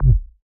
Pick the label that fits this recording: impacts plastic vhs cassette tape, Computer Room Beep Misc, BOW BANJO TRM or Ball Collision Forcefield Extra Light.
Ball Collision Forcefield Extra Light